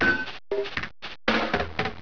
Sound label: drum pat